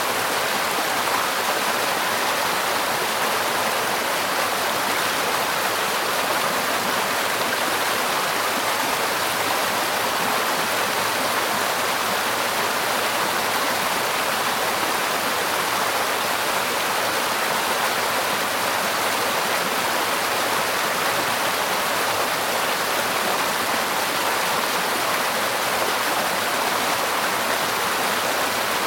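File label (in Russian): равномерный гул водопада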